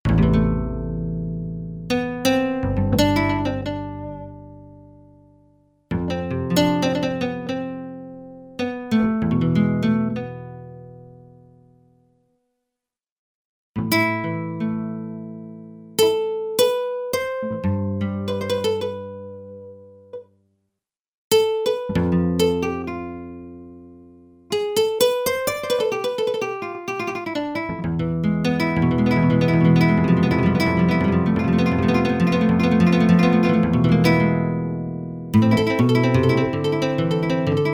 Nylon Gtr, Roland JV-880; Factory Patches

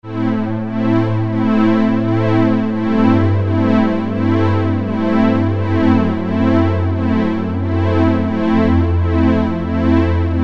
Strings, Roland Juno-106; Factory Preset

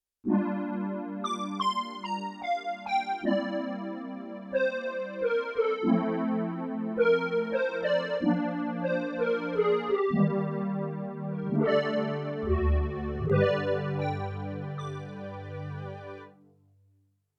Pad, Synth Set, Yamaha TX16W

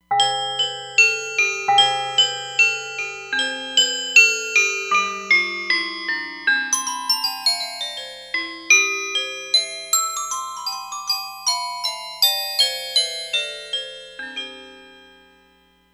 Glocken

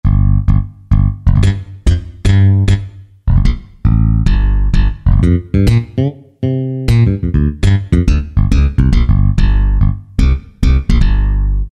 Slap It, Alesis QS8; Factory Preset examples

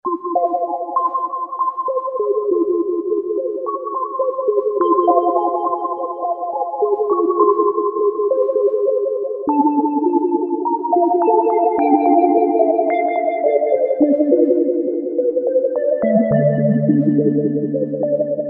SQUARE WOW; Akai SG01v

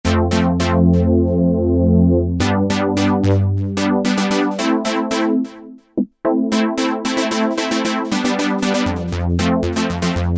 Korg microKORG Poly Synth; Factory Voices

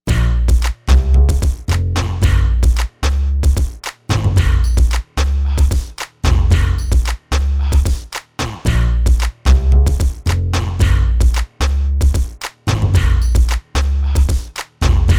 BEATBOX, AKAI XR20; Factory patterns

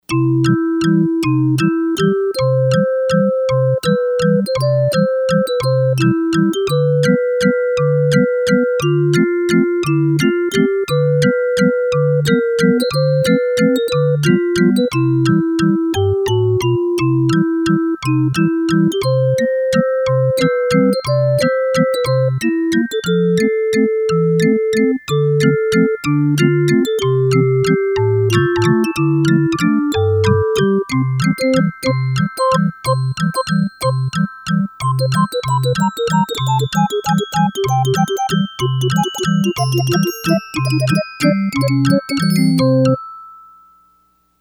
Yamaha DX100; Circustime; Factory Patches

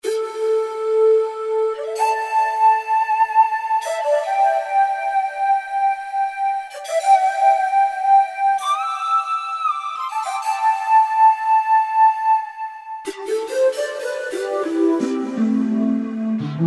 Voice Pipe Solo, Roland JD-990; Factory Patches

